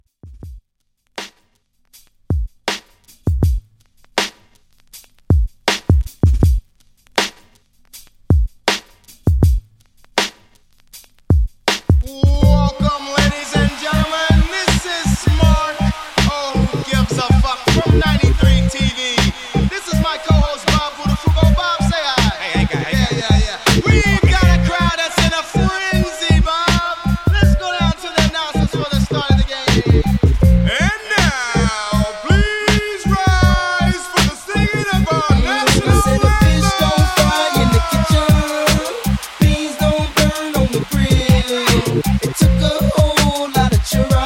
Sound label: In Outro 160 Bpm